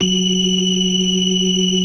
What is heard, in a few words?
BLOOD OCT, Synth and Organ